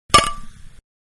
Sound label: Glasses Beer-Clinking; Clinks; Objects; Manhattan Production Music